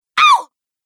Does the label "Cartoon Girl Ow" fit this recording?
Yes